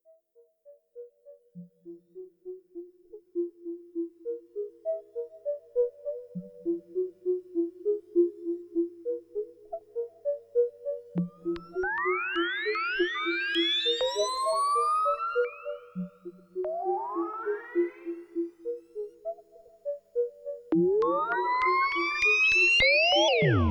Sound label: Noises